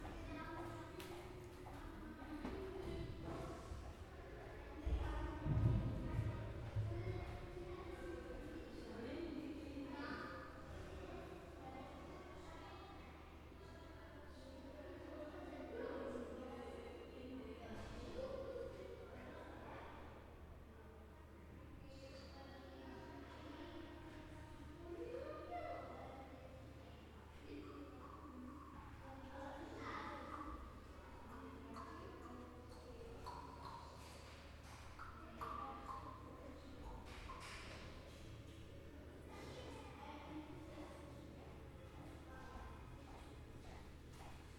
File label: School Hall